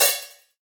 prominent open hi hat sample d key, open hi hat one shot tuned to the D note